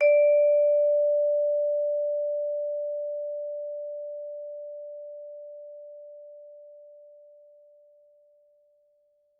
Vibes soft D4, Vibraphone, Soft Mallets; Struck Idiophones

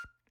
Hohner Special20 F Hand Vib rel F5, Harmonica; Aerophones; Releases; Free Aerophones

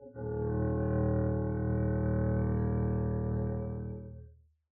arco eb1 mf up, double bass; dsmolken

